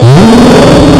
pain; opentdm; EVA-01

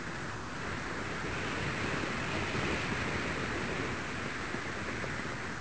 wind high

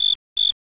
criket

